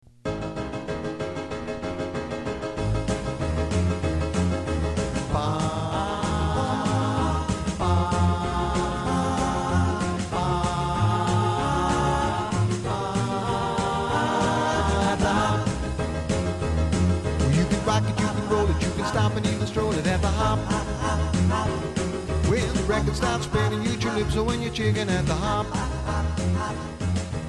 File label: 50s 60s Band